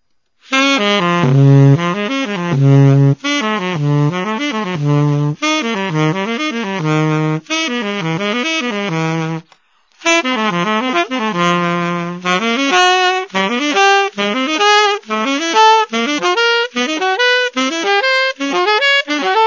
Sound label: arpegg, Conn alto sax; gold; new wonder; artist; portrait; morgan